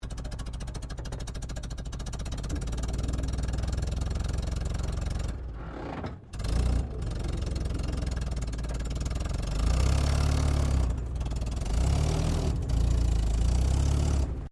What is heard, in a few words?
Armored Car Daimler Ferret Manouver Slow, British Ferret Scout Car; Trucks (Sound Effects:Vehicles)